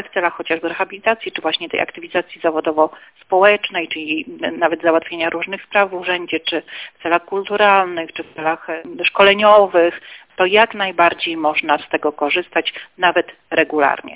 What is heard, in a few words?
door